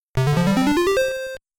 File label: Harp; Uninvited